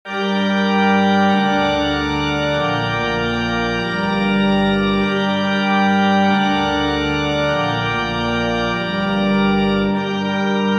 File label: Organ Prelude